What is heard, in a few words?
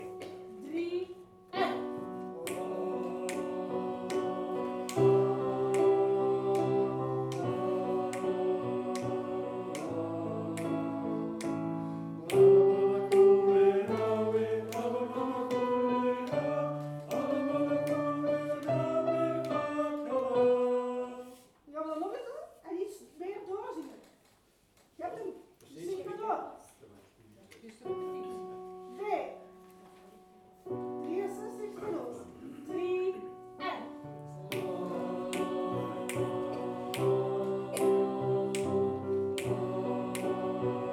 TENOR; SINT-CECILIA